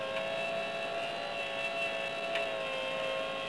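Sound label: astromech Motor loop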